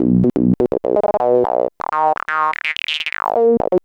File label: Acid Bass; Sonic Foundry (Sony Creative Software); Underground UK House & Electro